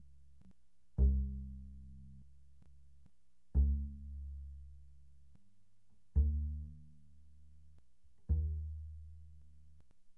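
Bass pizzicato mezzo forte sul E C2 B2, doublebass; Strings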